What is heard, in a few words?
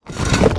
scoop; Smite; Cabrakan